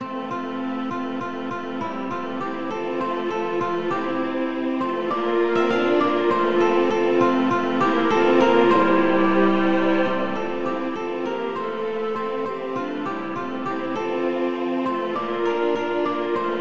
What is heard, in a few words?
polyinstru; hymns; Himnario grande